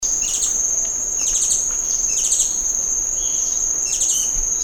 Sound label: Japanese White eye